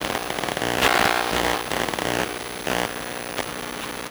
Noise, Pick Up Coil Noise; contact mic madness

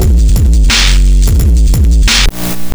BEAT, Hip Hop; E-MU Formula 4000 Series Vol. 1; Hip Hop Nation; Beats 59-88bpm

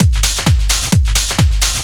drum 130 groove; Techno Trance Essentials

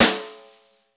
SNR, Snare; Club-50 - Foundations Roland; Ext.Snare 3

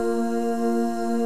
MIXED CHORUS

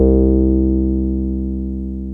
TB303 SQR, ROLAND TB-303 BASS; Rubber Chicken Software Sample Bank

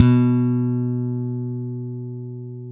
SLAP B, bass; Spectrasonics - Bass Legends CD 1; ALL THUMBS

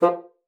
bassoon, F3; Xsample VOL-6

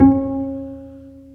STR Cb Pizzicato; Roland L-CD702; Cb Pizz 1

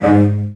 CELLOS A#2, Strings; ILIO; Synclavier Strings

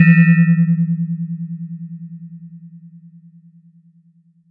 Chord; ModelCycles

